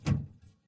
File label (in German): briefkasten schliessen; minecraft